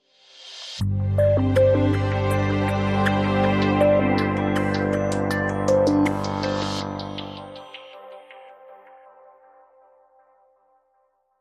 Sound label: Future Chill No Drums Sting